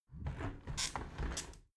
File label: window close soft sequence